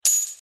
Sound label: Coin Bonus